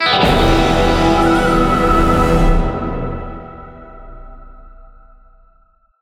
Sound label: Western Enemy Appear Stinger; Fortnite